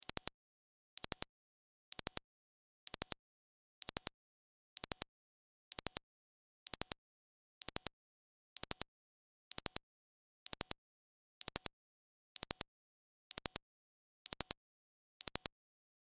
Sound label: Science Fiction Computer Beeps Loop